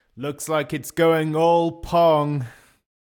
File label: Boo; Pitch Black Audio Pong